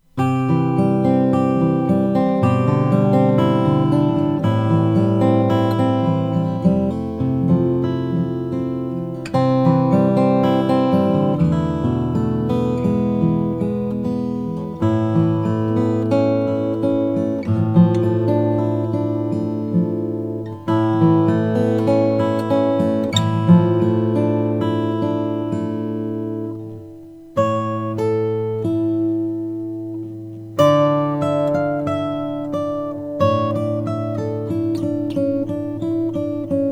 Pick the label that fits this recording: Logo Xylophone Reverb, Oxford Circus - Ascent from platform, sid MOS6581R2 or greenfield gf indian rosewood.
greenfield gf indian rosewood